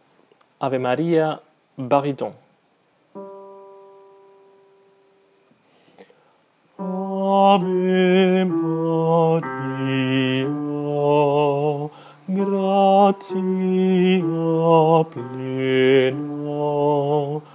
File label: baryton; ave maria de javier busto